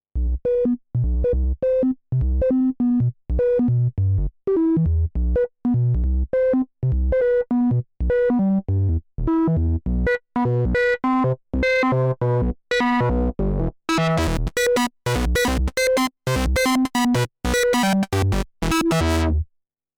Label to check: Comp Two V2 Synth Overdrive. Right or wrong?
Right